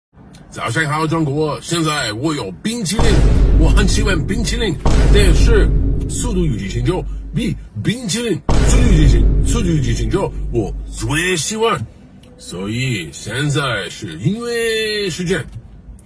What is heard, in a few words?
Vine Boom; Bing Chilling